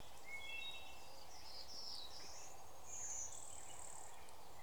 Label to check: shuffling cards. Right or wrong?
Wrong